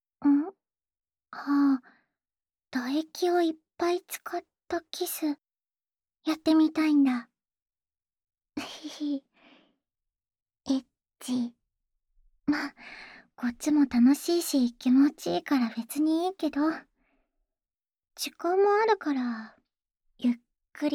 充滿唾液的深吻; 自慰輔助用; 青春べろちゅー委員会♪～唾液も舌も絡めまくり!最高のキステクで口内恋愛♪

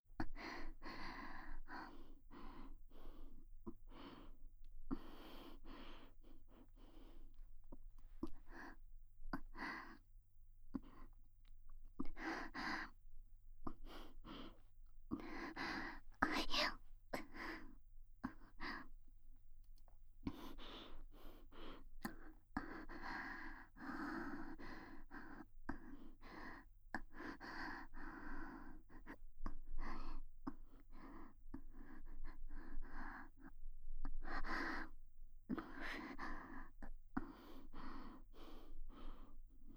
亲密感倍增耳语色情娇喘only音轨, ASMR

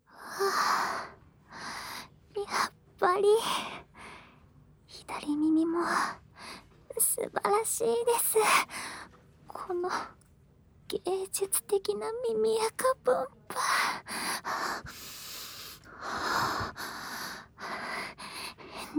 王道的竹制掏耳勺掏耳（左耳）, ASMR; 私立小戸入高校ASMR部活動記録; 瀬奈; プレミアムサウンド2022

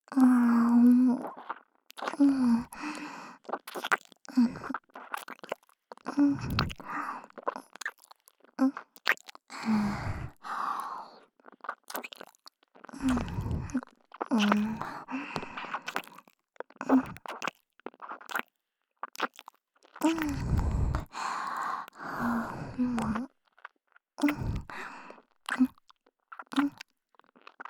满满的耳朵亲吻; 时雨姐姐也想要抱抱; NG音声独立音声

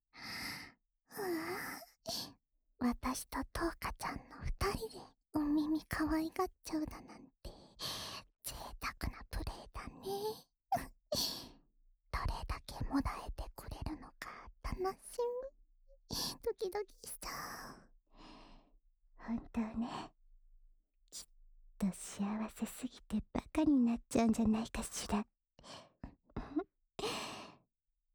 安眠用耳朵呼呼; 橙華和莉莉亞; 恋愛サポーター・青春べろちゅー委員会

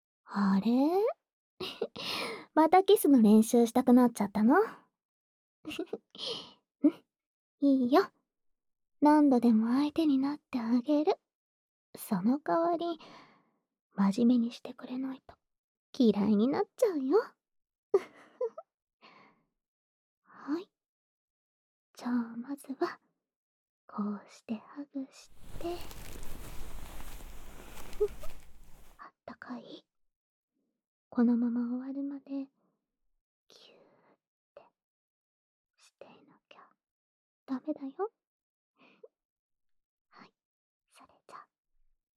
普通的接吻

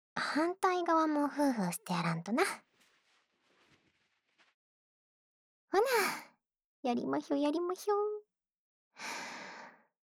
另一侧的耳朵也得帮你吹下才行！（吹耳朵左）

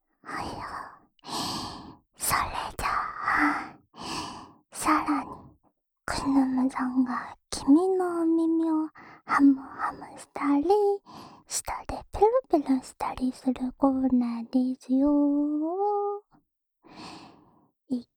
舔耳循环 (右耳); 魔族×按摩×世界征服 ～库努姆小姐的健康乐园～

